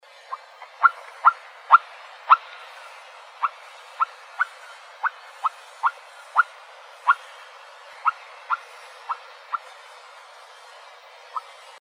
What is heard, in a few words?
calls, Nightjars; COPO; deviche